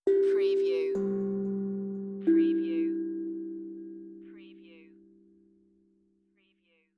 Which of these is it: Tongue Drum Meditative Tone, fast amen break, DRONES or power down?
Tongue Drum Meditative Tone